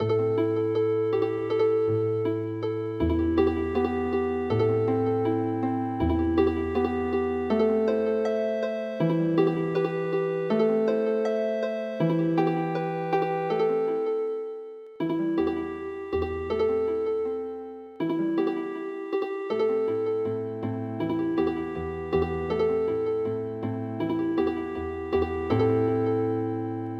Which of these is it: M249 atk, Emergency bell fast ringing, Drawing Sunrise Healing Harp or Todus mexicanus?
Drawing Sunrise Healing Harp